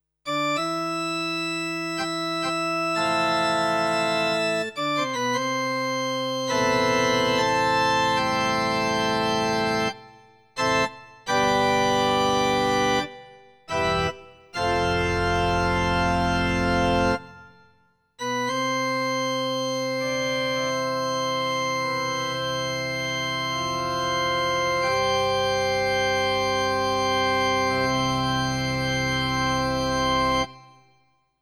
Church Organ

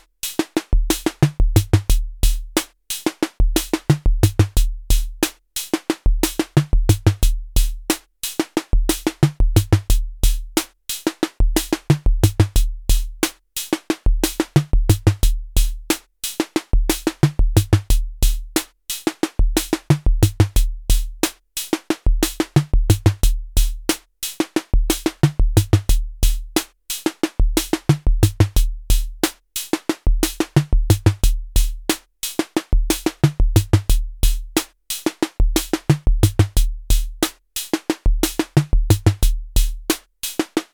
MFB 301 Pro

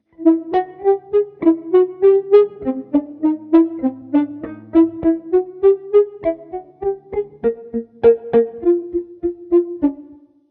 ebow Staccato

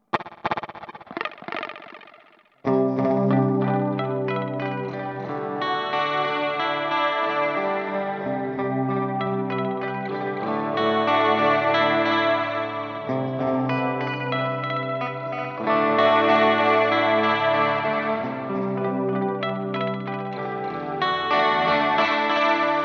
Empress ZOIA Emitterverb

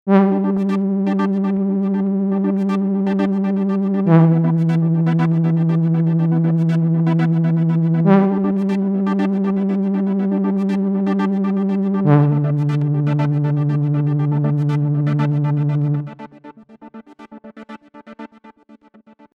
Multi Sound

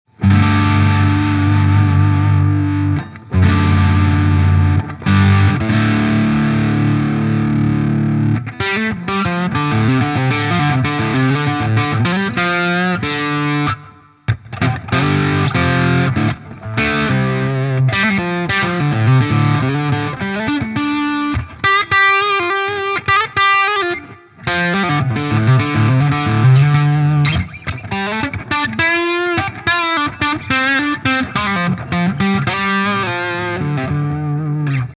Gain max.; PRS Horsemeat

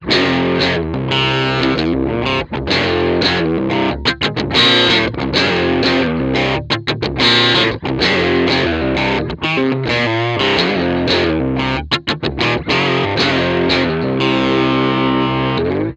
british pop amp

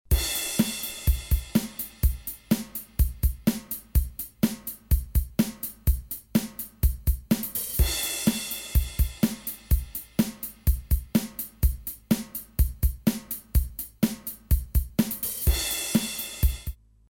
Mooer Synth Drum Loop Rock

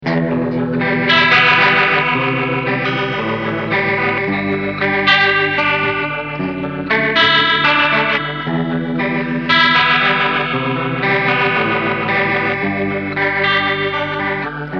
Rotary

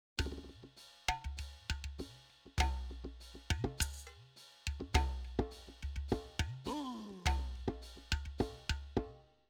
Perc West Africa; Simmons SD1200

